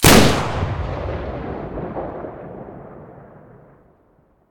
aa12 muzzle mono, weapons; STALKER; IWP

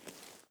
generic draw, weapons; STALKER; IWP

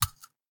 shell 12ga sand; STALKER; IWP

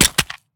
pistol drop gravel; STALKER; IWP

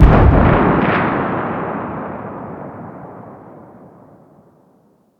rocket explosion far

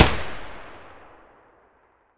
muzzle fn2000 distant

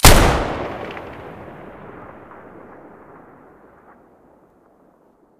m590 muzzle stereo; mossberg

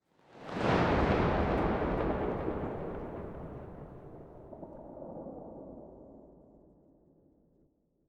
gauss shoot distant, weapons

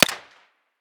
pm silencer mono distant

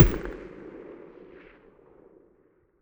mg muzzle mono very far, weapons stationar

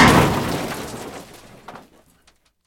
metal crash; STALKER; IWP

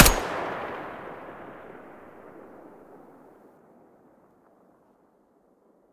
muzzle mono, weapons; fort

